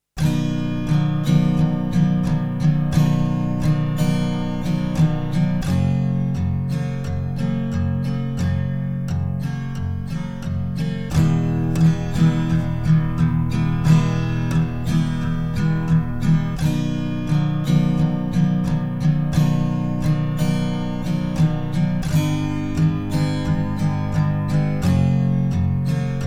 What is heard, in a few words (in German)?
gitarre